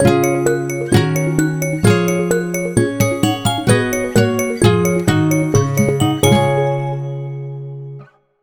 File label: Flock Shot end jingle; Wii Party U